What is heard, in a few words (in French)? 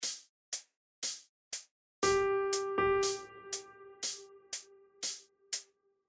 Croche pointée Double croche binaire